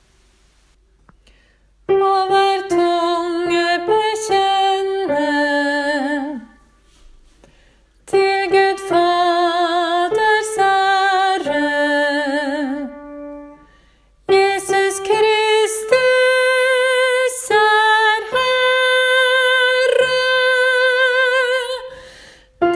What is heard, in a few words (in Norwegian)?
kristushymnen alt; åfjord